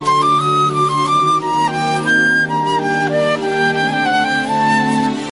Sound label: melody